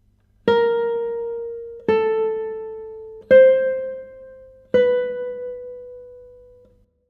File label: Bach Motif